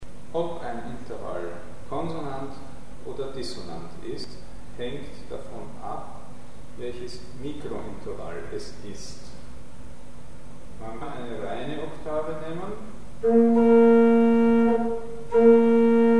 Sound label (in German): Oktaven verschiedene; Mikrobuch